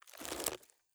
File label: CREAK Wood Tree Creak Subtle; mono; adams venture origins